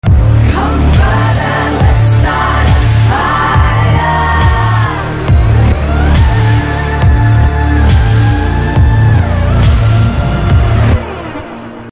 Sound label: Movie Theme Ringtone; HIT